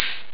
hihat open; Amiga Soundtracker Sample Packs

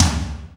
TOM, Ensoniq ZR-76

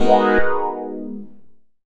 G#m7, Chords; Electronisounds

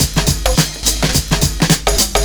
PERCS, 70 s Breakbeats

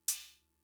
Cymbal; Fricke MFB-522

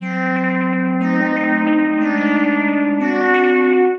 Odd Pad; Sonic Foundry (Sony Creative Software); The Electronic Music Manuscript Collection

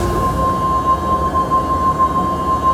ATM TONAL; Best Service - XXL Production Effects

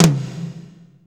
TOM, Drumscapes Roland; Northstar - Drumscapes Roland

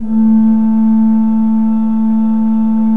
A2, Cathedral Organ, KOPPELFLUT; Propeller Island

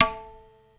TABLA; Crypton - DrumBase5000

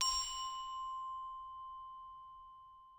glocken C6; Perc; M8; BBC

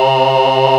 DIGI VOX

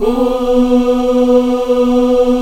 afro ooo, choir oos; Spectrasonics; Heart of Africa 1

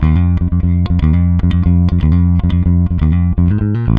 throb F, 120, bass; Spectrasonics; Bass Legends

